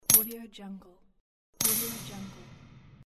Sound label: clank